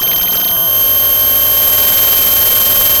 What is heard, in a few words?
Sound of a cat meowing